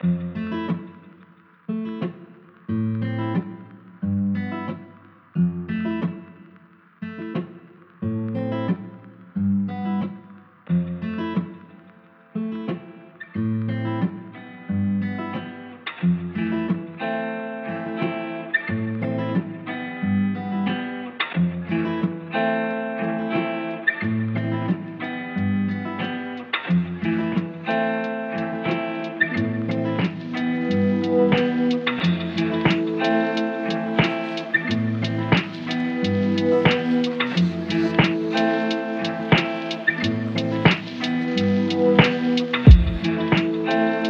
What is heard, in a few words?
LoFi HipHop, Lofi Beats, Lounge Club